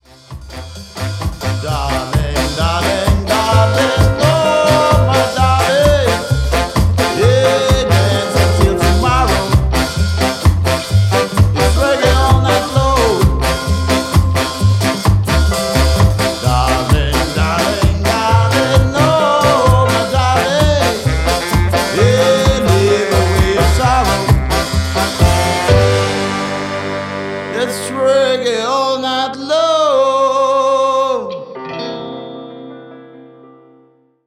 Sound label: Reggae